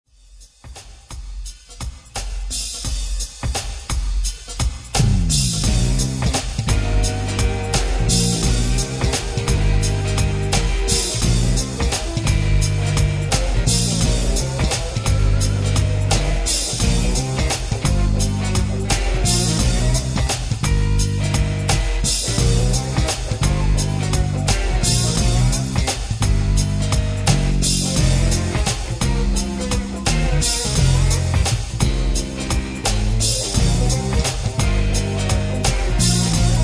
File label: C Blues